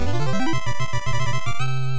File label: Get Item; Radia Senki; NES